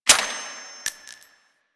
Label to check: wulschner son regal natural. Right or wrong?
Wrong